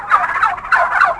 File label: TURKEY; animal; animal fun